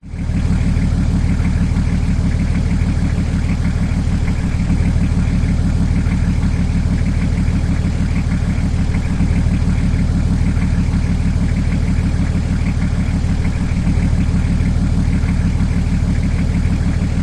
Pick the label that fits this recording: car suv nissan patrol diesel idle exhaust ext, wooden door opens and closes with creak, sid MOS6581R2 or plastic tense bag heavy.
car suv nissan patrol diesel idle exhaust ext